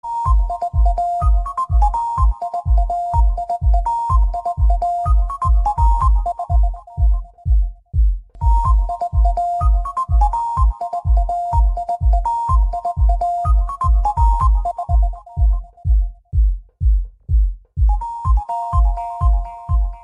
Android Melody, ringtone

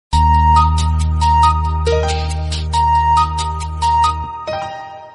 soft and simple, ringtone

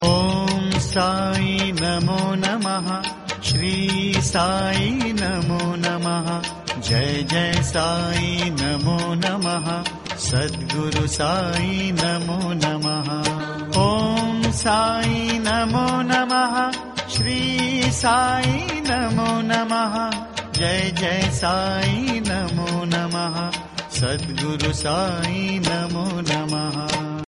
om sai

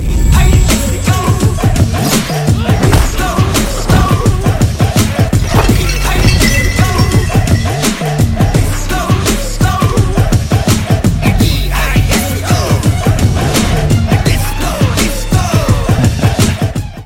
disco bgm, ringtone; coolie

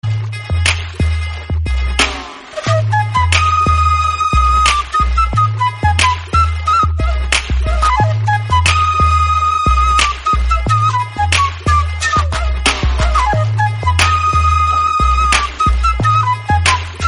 villain bgm, ringtone; kathi

